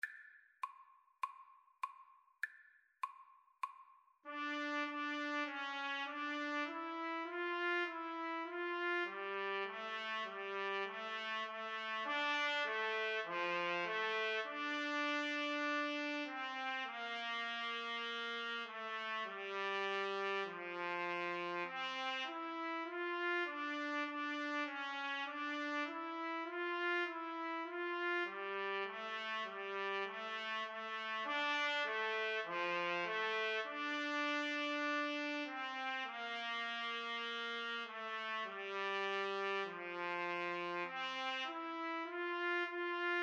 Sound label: trumpet duet